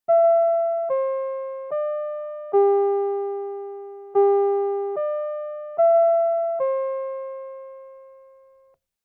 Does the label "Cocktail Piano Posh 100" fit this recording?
No